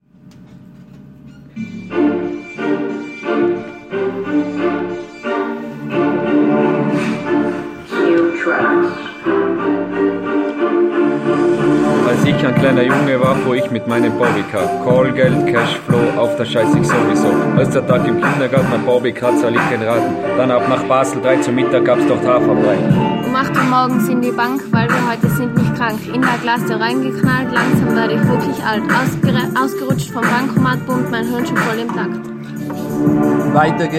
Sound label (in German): HIP HOP